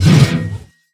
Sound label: swing; smite; chaac